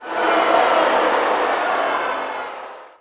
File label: Boo, Crowd, reactions